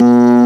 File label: B3 Lo Dist Slow A1